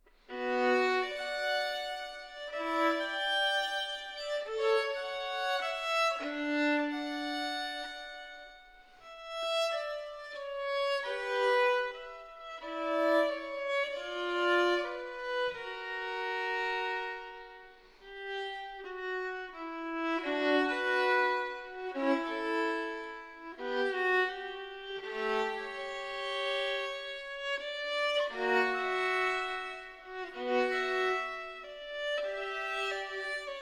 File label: violin partita in b minor sarabande; violin partita no. 1; bwv 1002 v